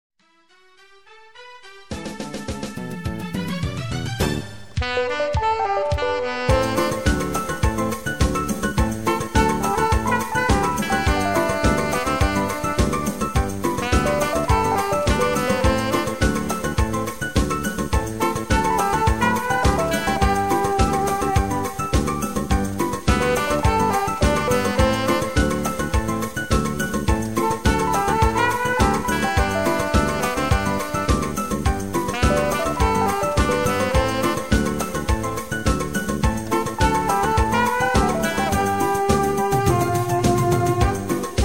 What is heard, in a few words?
sax, latin